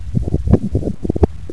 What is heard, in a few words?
healer, organ